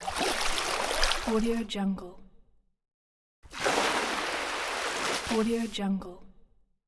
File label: wave